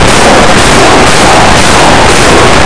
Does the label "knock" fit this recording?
Yes